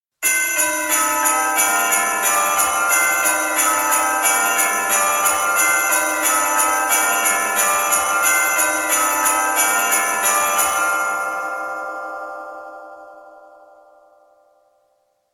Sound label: Christmas Bells